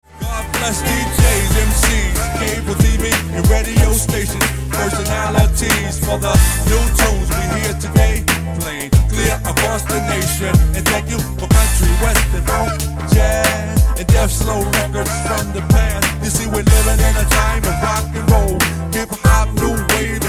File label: electric funk